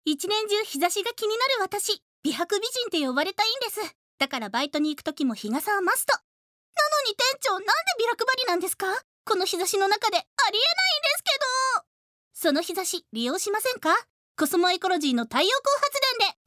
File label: radio